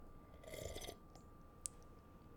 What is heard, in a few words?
Coffee Slurping Hesitant